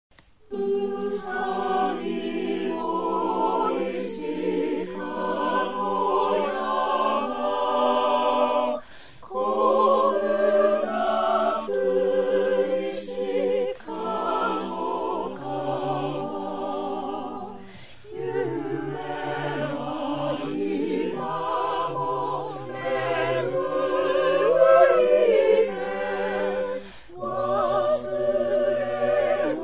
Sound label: a Capella